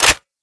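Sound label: change handle forward; Valorant; bulldog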